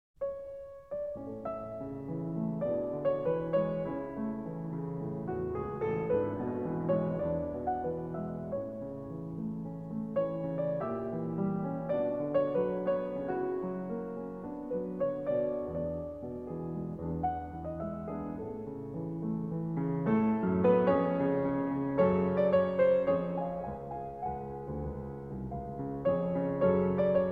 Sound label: Piano Sonata in A Major; Piano Sonata No. 13; D. 664; Schubert